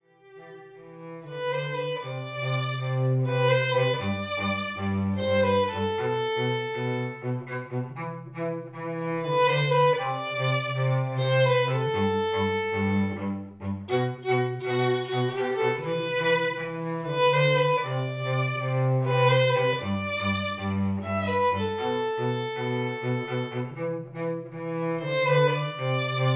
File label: bow and strings